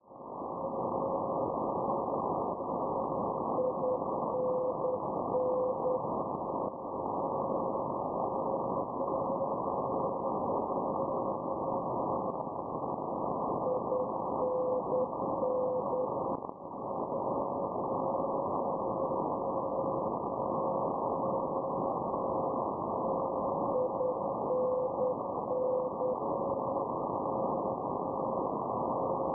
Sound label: NDB Innsbruck Austria 420 kHz; Perseus; Schwarzsee